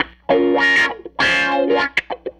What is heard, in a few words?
Strat Guitar Loop